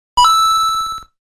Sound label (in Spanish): sonido de moneda, coin sound; mario bros